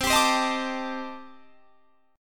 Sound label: C Minor 6th Add 9th C Guitar Standard; Cm6add9 strummed; JGuitar